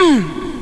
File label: Female uuh; Urban Shakedown